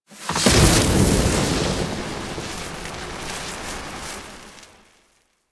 Necramech Closet Fire Burst; Warframe; Entrati; Necramech Hack

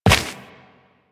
Run Dirt, Footsteps; Warframe; Tenno

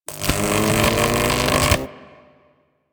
Lightning Strike Charge; Enemies; Abilities; Warframe; Teralyst; Rainalyst

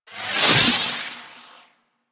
Melee Proj By; Enemies; Abilities; Arachnoid Wraith; Warframe; Corpus

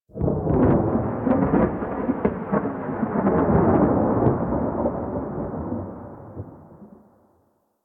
Thunder One Off, ambience; Warframe; Sacrifice; Vitruvian Memory

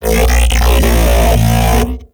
Mech Ambient Vocal

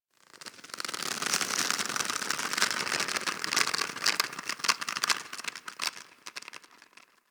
Tendon Stretch Large Close; Ambience; DE; Warframe; Infested Eris

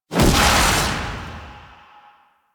Queen Blast; Ambience; Queens Chamber; Warframe; The War Within

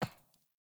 Grunt Boot Walk Metal; Characters; Enemy; Melee Grunt; Footsteps; Boots; Sinecure

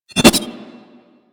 Tonfa Parry Whoosh; Warframe; Tenno

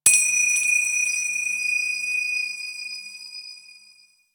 Temple High Bells; Ambience; Warframe; Corpus Ship Remaster

